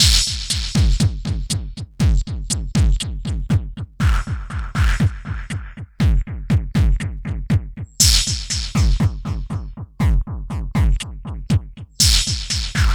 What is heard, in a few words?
Structured Rhythm, IDM Rhythms